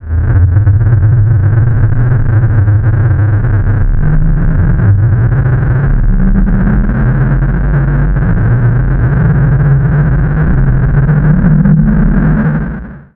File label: DISTORDED, AMB FX; USB Soundscan vol.03 - Pure Electro; AKAI